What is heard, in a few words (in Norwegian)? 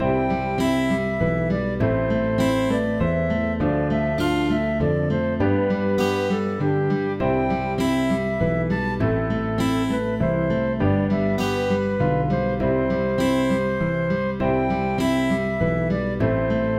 Arie - melodi med akkompagnement; Aamodt